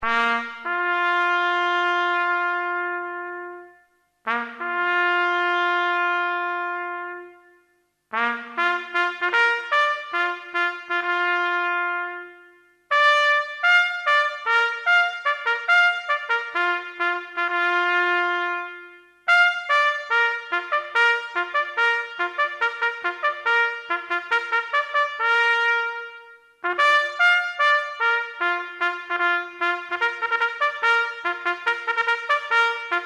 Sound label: Last post, bugle